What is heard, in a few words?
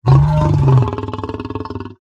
Cat Death; Groovy Cat